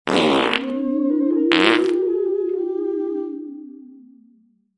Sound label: Sound Carpet Animation; CoolLama